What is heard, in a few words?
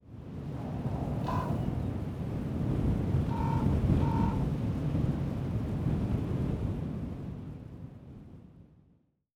wind